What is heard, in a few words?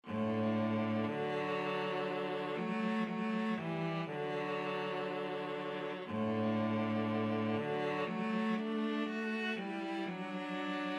clarinet concerto, viola cello duet